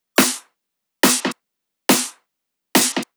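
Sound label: drum Loop 140BPM snare; Vengeance - Essential Clubsounds Vol.5; VEC5 Drumloops; VEC5 Multi Loops 140BPM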